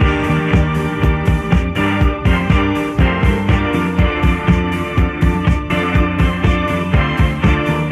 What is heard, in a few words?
horns; Phonky